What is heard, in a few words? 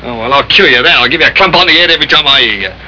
Snore; sylvia scarlett